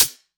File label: Closed HH